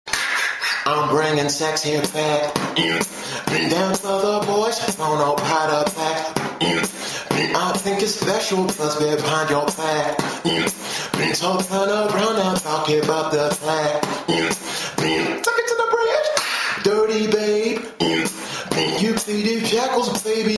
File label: Human Beatbox